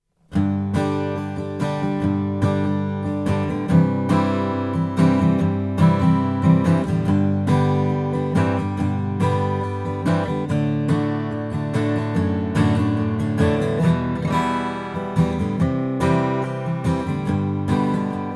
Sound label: froggy bottom sj deluxe spalted maple